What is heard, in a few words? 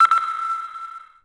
Clicks; Urban Chaos